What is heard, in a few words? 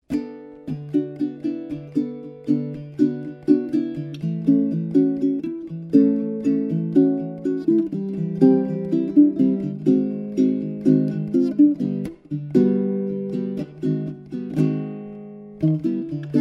uke